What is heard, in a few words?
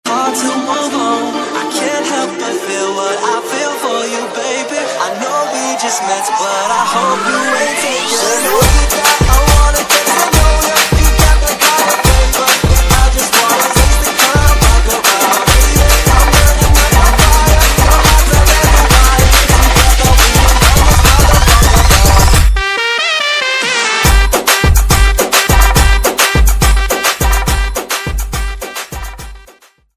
bass boosted ringtone